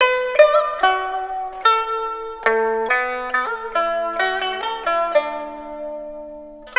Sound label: PIPA LOOP; USB Soundscan vol.60; Asian Traditions; AKAI